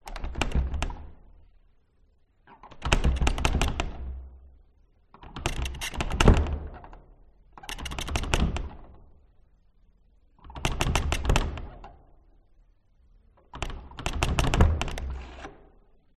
Door Abandoned Mansion Hallway Perspective Reverberating Old Wooden Door; Locked Door; skyes audio